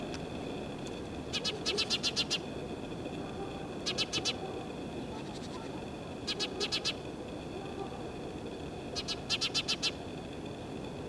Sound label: Parus major like Passer montanus